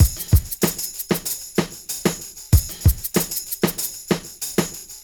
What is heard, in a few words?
Club Tip, R&B Drums